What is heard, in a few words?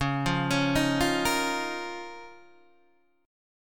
C sharp 6th Add 9th C sharp down Guitar Open D